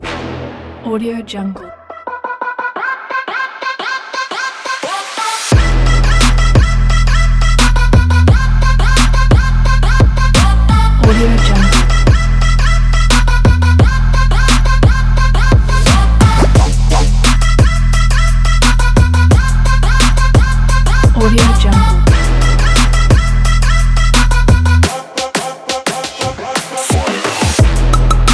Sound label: bass trap beat